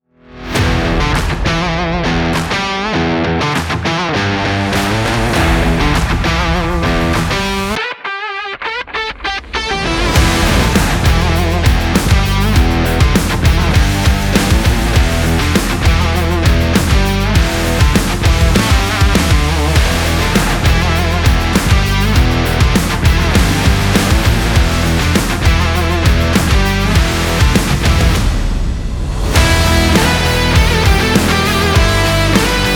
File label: energetic action sport